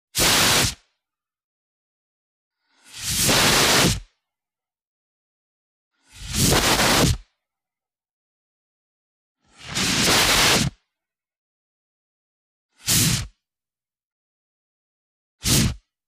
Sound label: Fire extinguisher